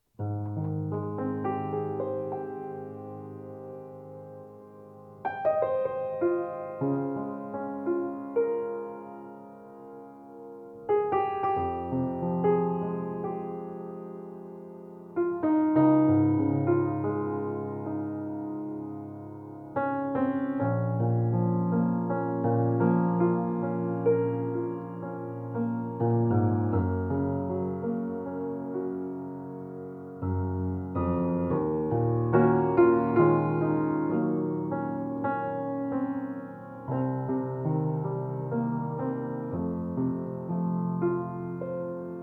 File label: Piano